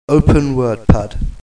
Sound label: Openpad